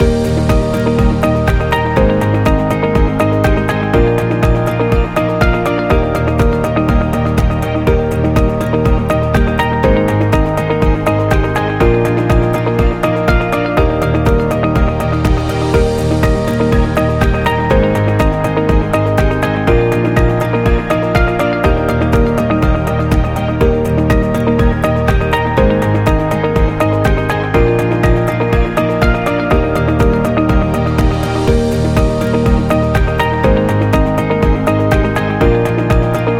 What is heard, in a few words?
Corporate Positive Loop; explainer